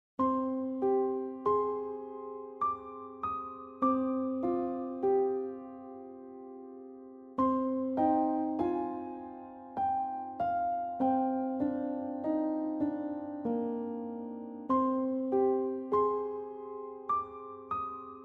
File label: Piano Is Hard; Hollow Knight